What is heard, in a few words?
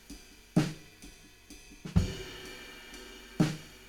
Room A